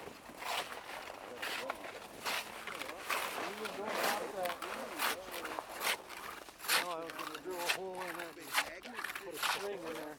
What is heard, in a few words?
Ice saw above water, Ice Fishing; The Ways - Ice Fishing; Nat Sound Selects